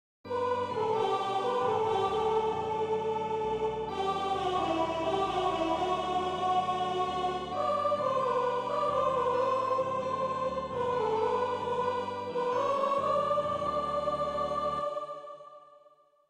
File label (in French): Alleluia des montees a 4 voix avec schema melodique invocation a 2 vo; Messe de Verrieres